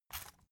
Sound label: salt mill squeak close